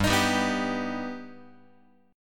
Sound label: G Augmented G Guitar; G+ strummed; x,3,6,5,3,6; JGuitar